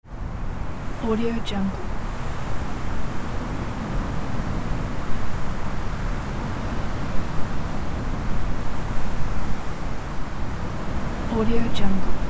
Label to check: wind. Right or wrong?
Right